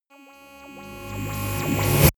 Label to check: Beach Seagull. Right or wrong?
Wrong